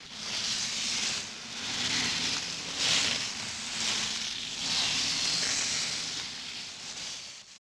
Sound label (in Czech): Tahnuti Tela